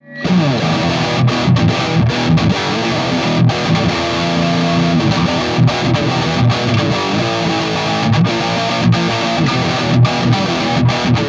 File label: Fast Chugg Riff